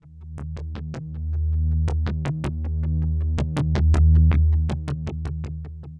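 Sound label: synth bass, ambient, 160, C; eJay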